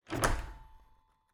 Cabin, front door, split door, oak, large, iron fittings, close, wide; Eiravaein Works; Vaeyan IV